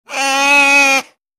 Otter Sea Call Whine

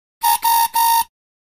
Horn Highpitched, horns

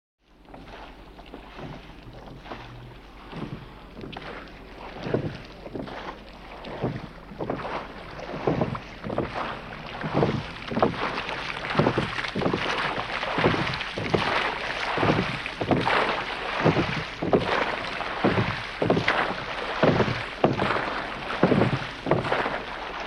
Sailing Ship Water, sails

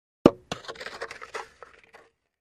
Basball Helmet Toss; baseball fx & games

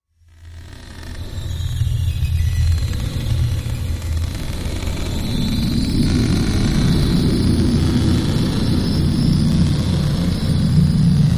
Static Wind Static Tone

